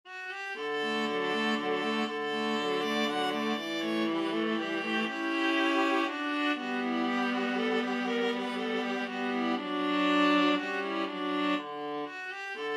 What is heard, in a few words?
haydn minuet viola trio